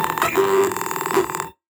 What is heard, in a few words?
DEFENCE BOT idle